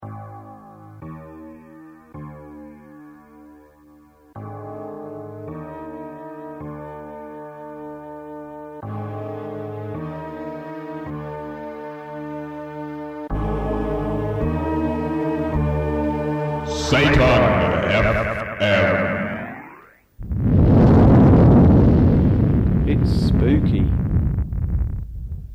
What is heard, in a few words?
spooky